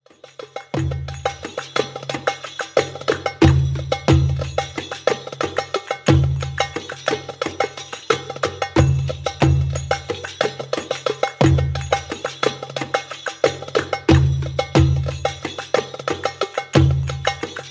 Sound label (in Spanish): RITMO MASMUDI KABIR lento